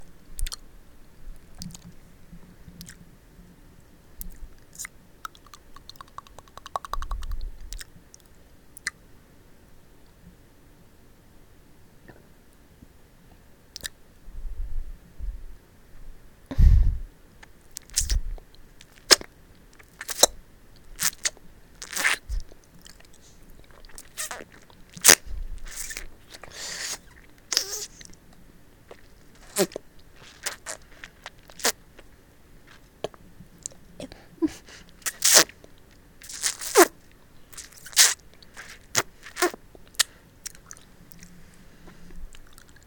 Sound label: Saliva noises and Slight Sucking Salivating saliva noises; slight gagging at end; F4A; (IT'S BAAAAAAD); (oops)